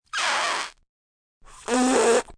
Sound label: Mouth FX Lip Blows, Mouth effects, lips vibrating, blowing through lips (human voice)